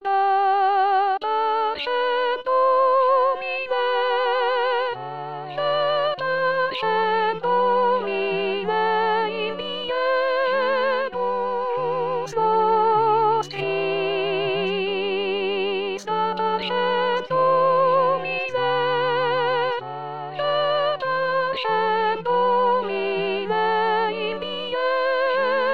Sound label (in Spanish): dapacem S voz, con voz; Coro "Con dos Bemoles"